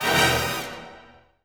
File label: Combo Stab; Bee Movie Game; PS2 Wii Windows Xbox 360 gamerip 2007